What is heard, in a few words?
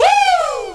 Woooo!; Urban Shakedown